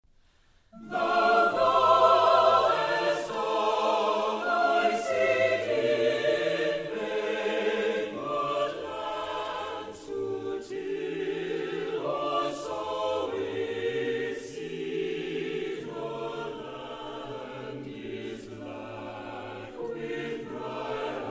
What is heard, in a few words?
Chorales